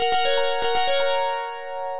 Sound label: Notifier, ringtones; Samsung; Galaxy Folder 2